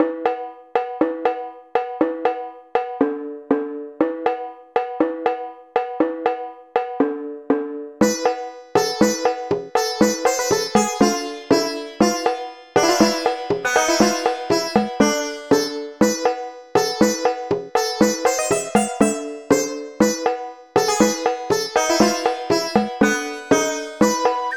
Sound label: snes